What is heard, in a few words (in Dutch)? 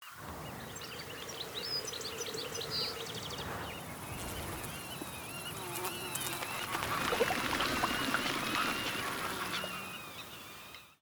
video vrouw SFX; Social Story; biofonisch orkest